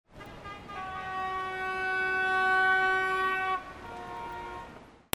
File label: CARS